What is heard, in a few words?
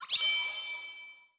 Dice Race Track Finish; blue archive